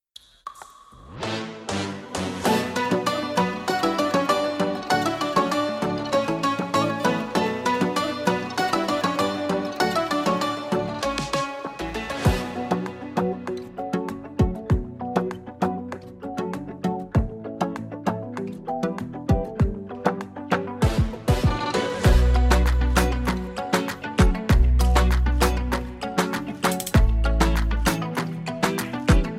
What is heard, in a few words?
Instrumental